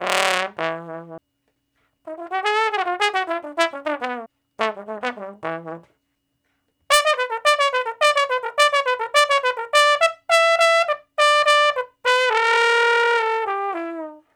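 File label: Trombone Slow Blues (E V, IV, I, V, I); Crimson Blue and Fabulous Horncraft 4 RnB; Horncraft for R&B; Solos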